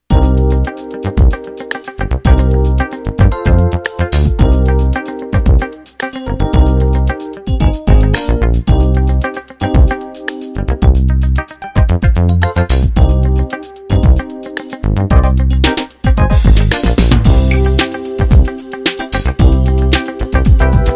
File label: 16 beat soul